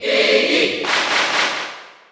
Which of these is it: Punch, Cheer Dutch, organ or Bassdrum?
Cheer Dutch